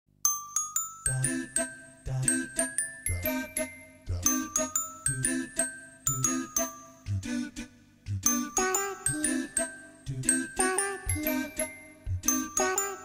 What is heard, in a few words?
Amazing Blues for Babies